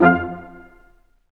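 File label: BRS Q.SHT, Roland, brass staccatos, quintet; Roland L-CD702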